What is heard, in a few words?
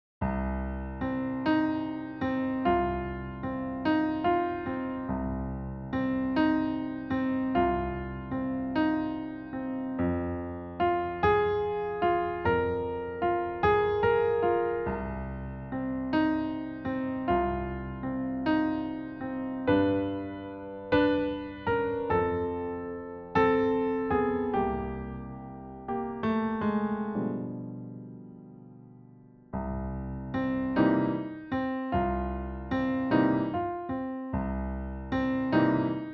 Blues